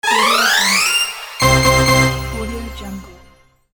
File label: level completed